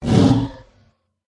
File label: mantikora wound